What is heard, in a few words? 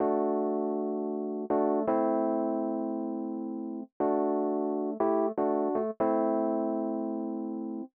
120 Rhodes A, Keys, Nu Jazz House; PowerFX